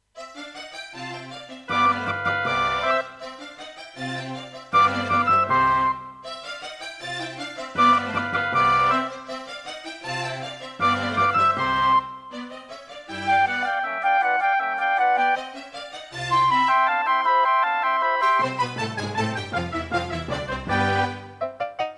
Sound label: Roland CM-300; classical; Mozart; Concerto K467 partial